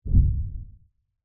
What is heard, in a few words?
Monster Footsteps, Horror, Monster, Footsteps, Lfe, Hit; Monsters (Sound Effects:Horror); BlastWave